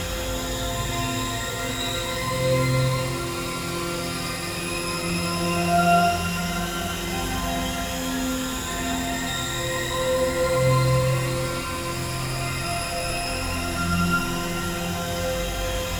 Tonal Elevator - Endless Rising Loop, Shepard Ambiences; The Sound Pack Tree